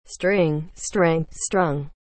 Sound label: string